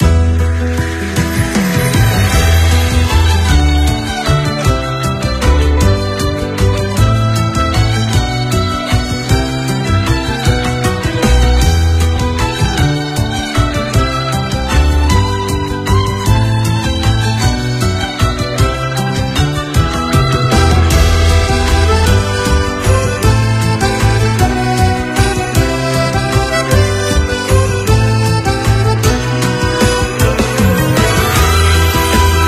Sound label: Folk Roma loop; Drago Noka; PS4